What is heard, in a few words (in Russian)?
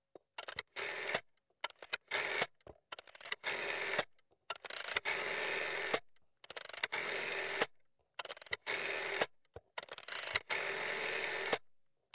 Krutyat disk telefona